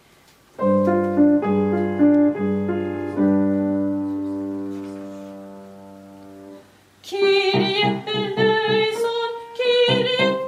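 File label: soprano; kirie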